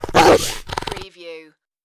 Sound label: dog creature attack